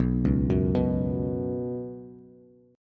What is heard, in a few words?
B Suspended 2nd B down Bass, Bsus2 chord; arpeggiated; JGuitar